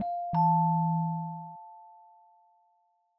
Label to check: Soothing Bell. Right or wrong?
Right